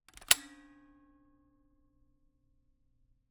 Camera, Kodak EK2 Instant, polaroid, large, plastic, springs, snap; Eiravaein Works; Vaeyan IV